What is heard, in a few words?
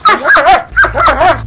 bark